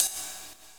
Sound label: Ride; Equipped Music; Loungin House